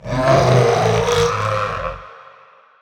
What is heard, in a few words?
BRAMS, Cinematic Sound Effects